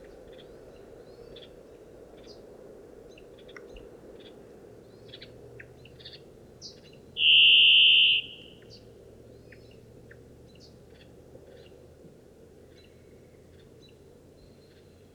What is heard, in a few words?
Varied Thrush Ixoreus naevius; BC